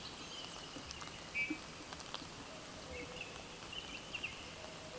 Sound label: Pyrrhula pyrrhula